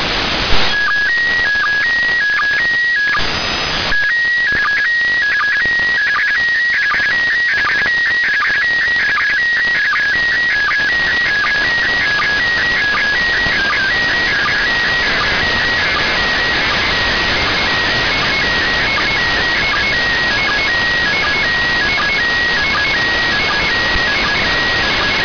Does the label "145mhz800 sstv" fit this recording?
Yes